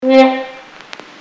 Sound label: Staccato trombone plunger mute on vinyl